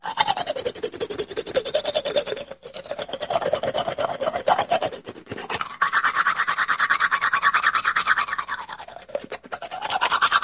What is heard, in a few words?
toothbrushing